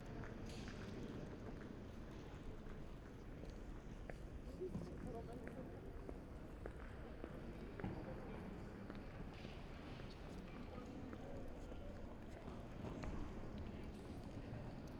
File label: Urban Large Building Entrance OUT Stereo Binaural